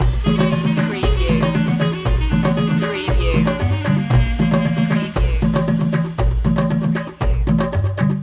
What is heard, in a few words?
ethnic world loop